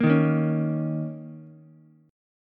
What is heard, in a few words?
Bb Minor Double Flat 5th down Ukulele, chord; Bbmbb5 chord; strummed; JGuitar